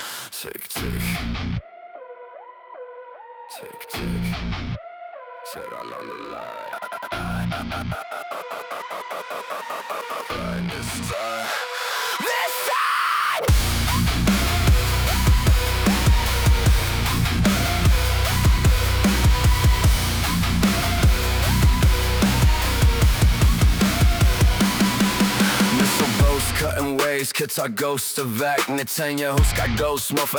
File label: Rage Anthem